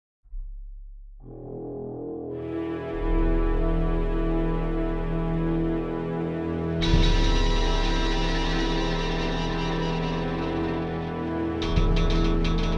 start menu music creepy; The Curse of Nordic Cove

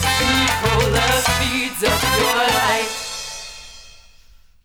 Speed Sting; Zombie Perksacola

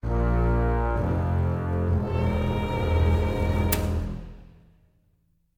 Stinger Event Injury; Across the Obelisk

